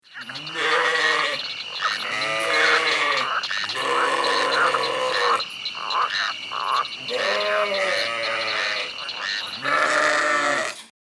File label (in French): duo mouton grenouille; loto sonore; loto des animaux